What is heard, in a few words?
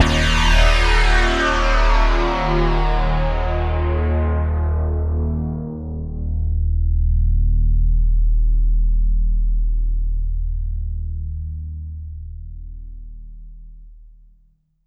steel bass hits D#1; Q Up Arts; Denny Jaeger Private Collection